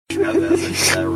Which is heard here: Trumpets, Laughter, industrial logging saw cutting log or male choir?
Laughter